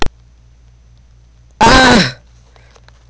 aggghh; Bionicle

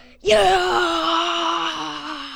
short war cry

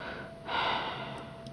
sigh; Nokama

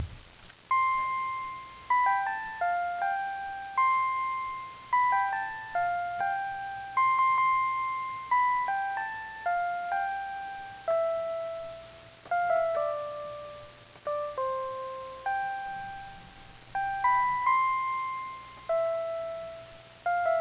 bionicle music

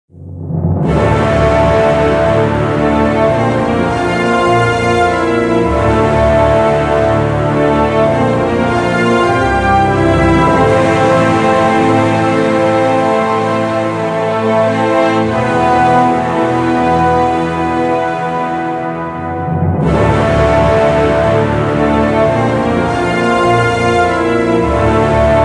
music victory long; Brickshelf; Cradock

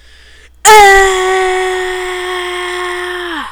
scream

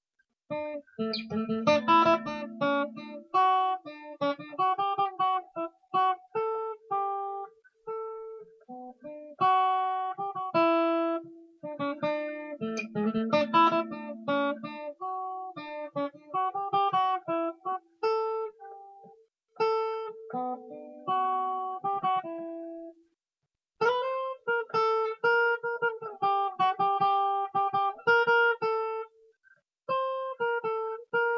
guitar rendition

